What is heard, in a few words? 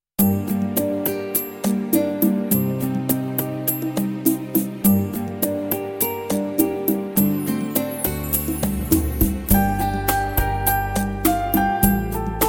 rumba